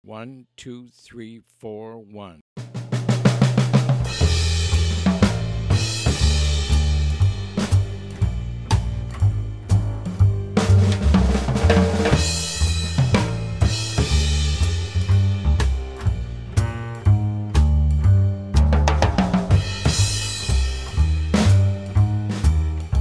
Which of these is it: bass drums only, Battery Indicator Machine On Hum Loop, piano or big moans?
bass drums only